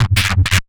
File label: Down Filth, Drum n Bass, Pads; KJ Sawka; Drum n Bass & Beyond